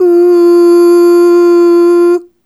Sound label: Ooh Short F3 Dry; Harmonic Building Blocks